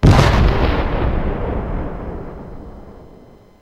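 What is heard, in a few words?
explosion; cstrike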